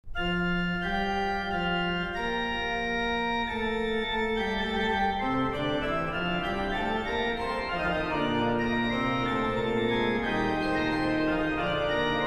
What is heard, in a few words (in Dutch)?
orgel